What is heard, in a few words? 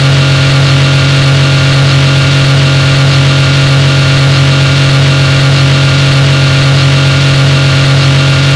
engine